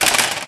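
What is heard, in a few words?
physics clipboard impact small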